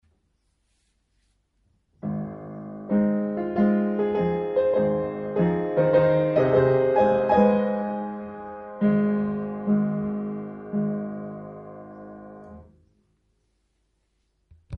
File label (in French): Stabat mater piano seul; Grandval n4